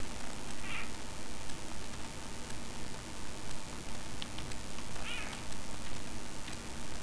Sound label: crested squeak